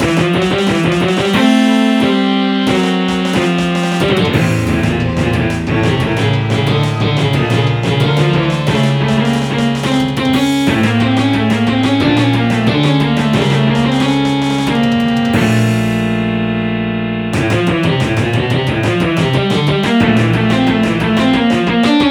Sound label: Battle; ハロウィン曲のつもりのなんちゃってオーケストラ曲。